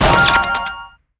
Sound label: chocolate box small; sfx